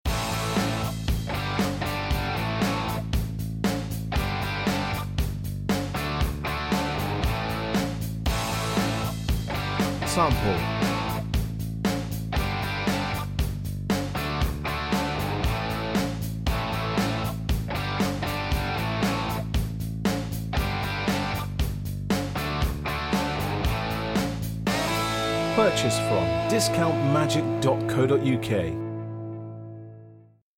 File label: rock